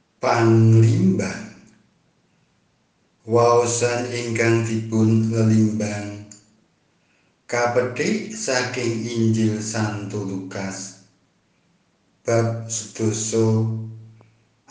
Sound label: duduk